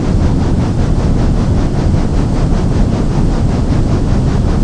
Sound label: fan